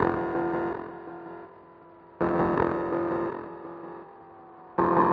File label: field scaper